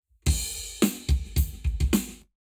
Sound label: Lab Wet Drums 0deg